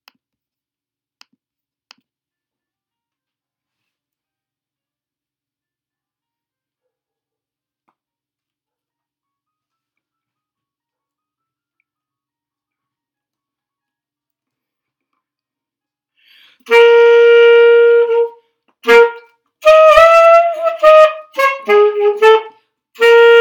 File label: blues alto sax